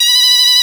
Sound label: SONA B4